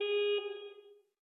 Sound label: ambient port default; World Of Warships